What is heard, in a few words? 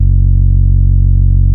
F#1, Vintage Timetraveler; Sonic Reality; HAMDIRECT